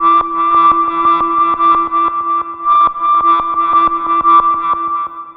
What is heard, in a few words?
Analog Synth Pattern; Sonic Foundry (Sony Creative Software); Electronic Point Blank